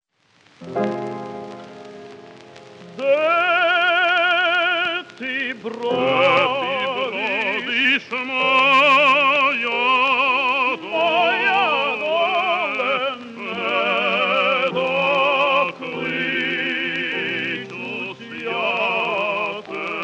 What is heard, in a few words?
ukrainian tenor